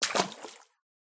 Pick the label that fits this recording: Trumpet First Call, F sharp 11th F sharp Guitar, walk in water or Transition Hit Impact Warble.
walk in water